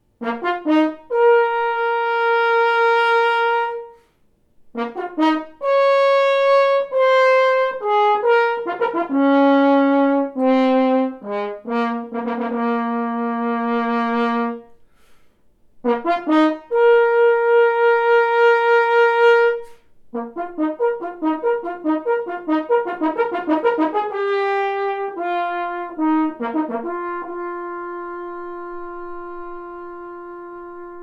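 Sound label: spun bell, Horn; spun vs hand hammered bell flares; Engelbert Schmid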